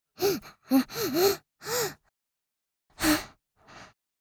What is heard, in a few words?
Allergy breathing kid child female